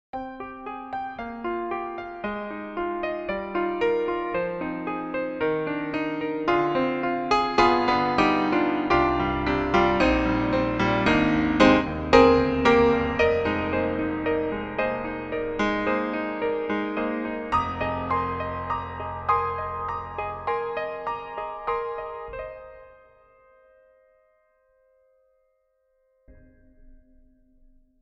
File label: August Foerster Grand; Native Instruments; Kontakt Factory Library(Orchestral)